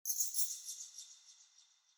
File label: Under City Bat Chatter Distant; Ambience; Dungeons